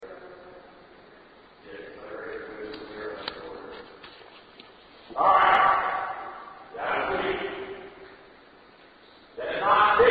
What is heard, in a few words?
Shout; Theater21; Klinge